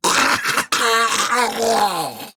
Death Grineer Ghoul Sargeant; Grineer Ghoul Drill Sargeant; Battle Barks

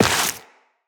Step Dirt, Footsteps Walk; Tenno

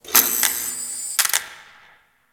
Light Flicker; Ambience; Props; Warframe; Orokin Derelict

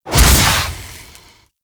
Fire Shot, Flame Thrower; Ignis Wraith; Weapons

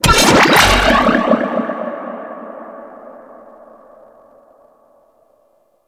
Mine Drone Underwater Bomb Spawn; Warframe; Grineer

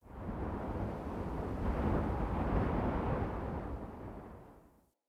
Fort Wind Gust Soft Buffet Airy; Ambience

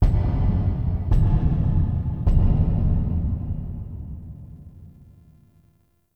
Knocks Large; Ambience; Warframe; Entrati

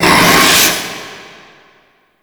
Breathe Out; Warframe; Stalker

